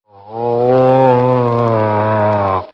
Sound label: ohhohoow, Voice; ooh ahh